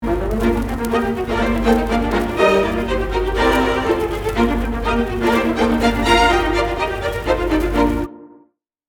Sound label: Overture 2 Layers, Layer 1 is the harmonized melody / • Layer 2 is a 16th note excited counterpoint in the violas.; Rule of Three; The Nutcracker; Bar 9